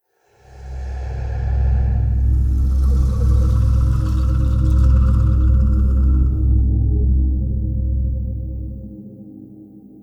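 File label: Woosh Music; from dust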